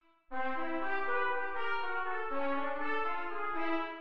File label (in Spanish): Dominante en C